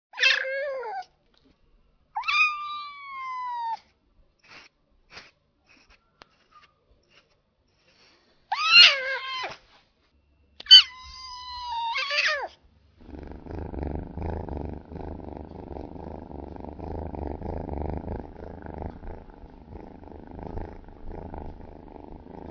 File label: Kitten with mama